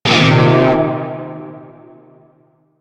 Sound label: Shawzin Blues Chord L; Music; Warframe; Narmer Shawzin